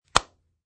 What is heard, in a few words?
Cellphone; Flip flap cellphone closes